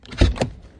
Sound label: Electro FX; Ueb HE Electro FX